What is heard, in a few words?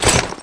lock; sunzibingfa3